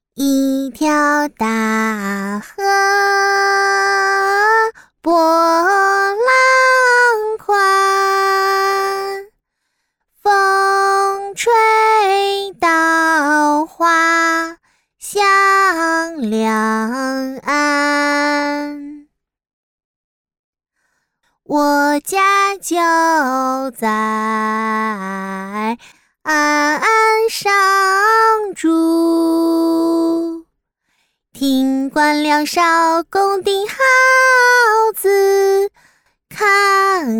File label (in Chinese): 女 女童 清唱